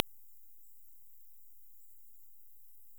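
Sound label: Rhinolophus megaphyllus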